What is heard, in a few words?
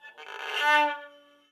Rosin